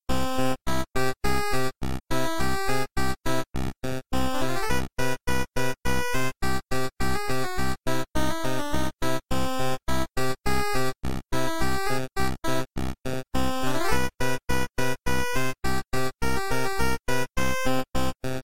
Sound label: Banjo